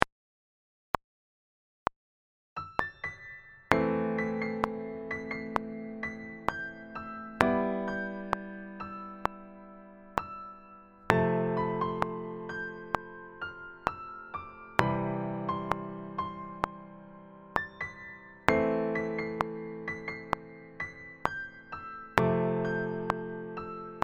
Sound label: melody